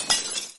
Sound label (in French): verre